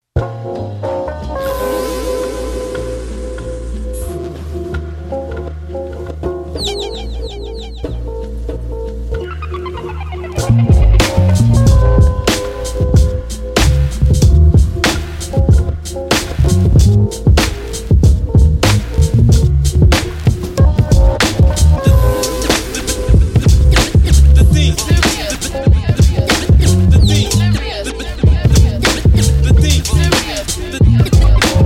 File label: Electric Piano